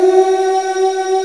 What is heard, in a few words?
AKAI VOX, SYNTH VOX; East West - Global Sounds